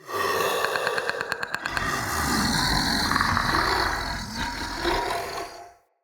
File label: Male Vocal Breath Light, Enemy; Mockery; Mockeries